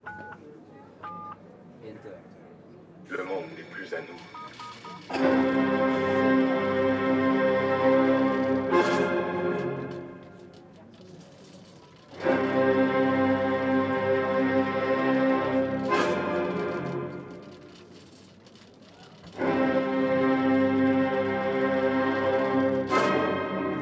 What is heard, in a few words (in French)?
orchestre automates